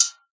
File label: notif; VotV; VoidMod2